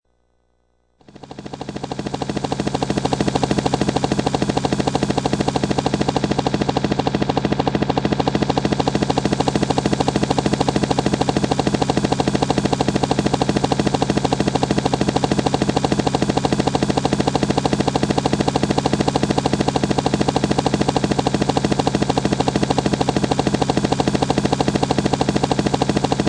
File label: Helicopter, A high quality stereo recording of a helicopter above